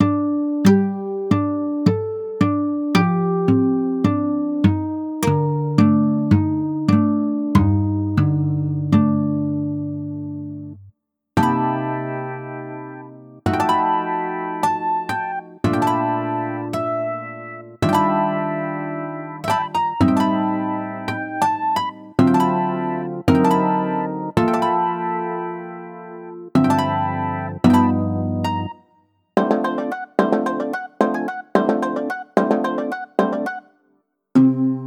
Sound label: Solo Acoustic